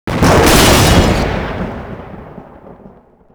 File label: big bang; WAR